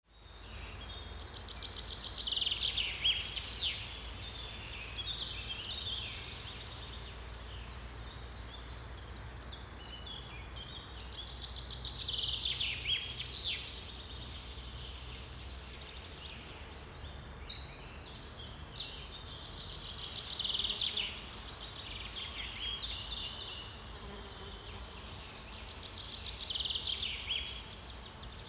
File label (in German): Vogelzwitschern Wald